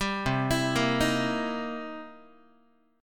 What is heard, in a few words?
C 7th Sharp 9th, C, down, Guitar; arpeggiated; 10,8,7,8,7,x; 4A,4E,5A,5D,5G#,6C#; chord; JGuitar